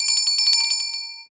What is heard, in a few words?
Bell Ring